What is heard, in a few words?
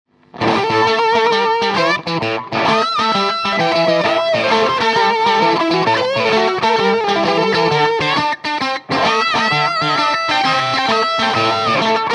Tweed Strat British Treble boost